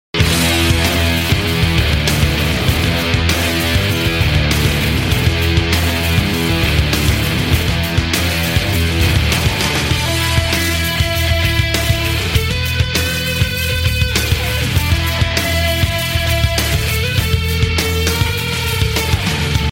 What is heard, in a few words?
instrumental